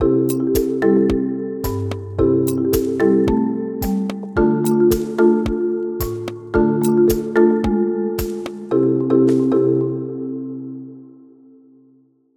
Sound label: Ayla Tone